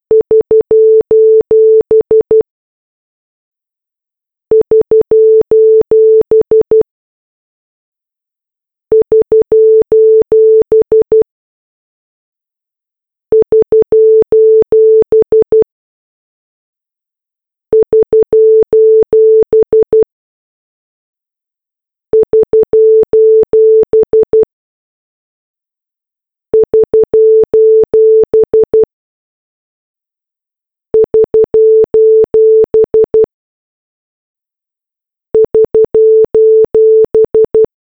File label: SOS loop